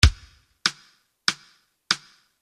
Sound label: click